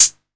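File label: 808 closed hi hat; 808 DRIVE; Wizoo - Platinum 24 Electronic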